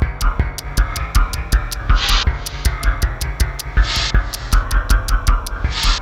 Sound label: RISK LP, Industrial Loops